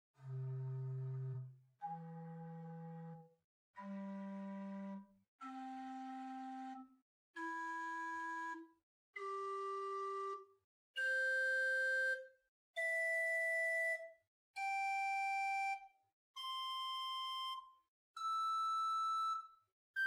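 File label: Rohrflote 4 arpeggio, chamber organ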